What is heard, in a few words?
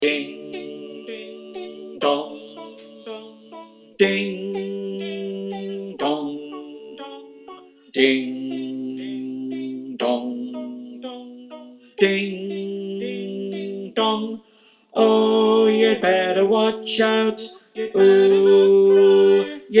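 Bass, choir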